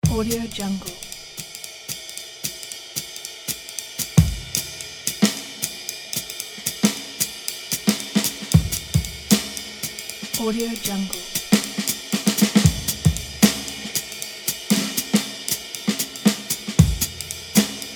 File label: fast jazz drum solo; whiplash